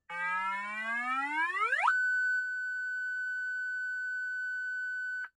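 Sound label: Horn, emergency vehicle safety warning; 911 Signal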